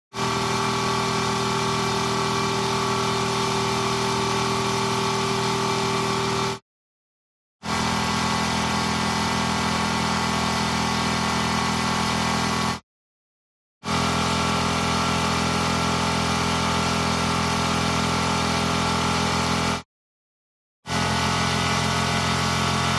TRANSPORTATION MOTORCYCLE Engine Medium High Constant RPM Ascending